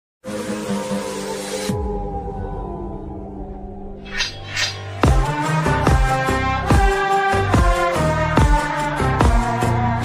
wolf ringtone turkish attitude ringtone turkish ringtone islamic ringtone